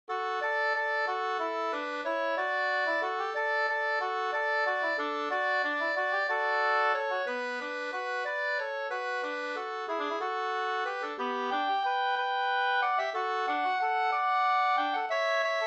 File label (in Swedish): folkdans, Gammal familjevals